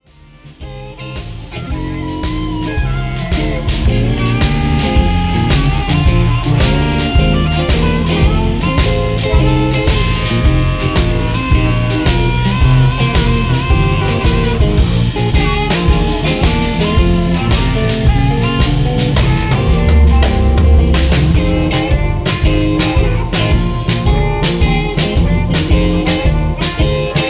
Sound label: Chicago Blues in F